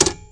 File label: Spring; Ruckus